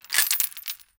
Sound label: equip chainmail torso